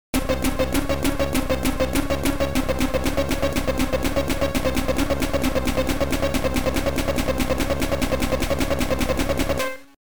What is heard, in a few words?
BGM UNDERWATER; Sonic the Hedgehog Genesis; Game Boy Advance